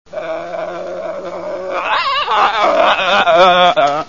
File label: zombie; The Zombie, from Saturday Night Zombies; Foiled